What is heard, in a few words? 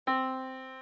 yamaha Disk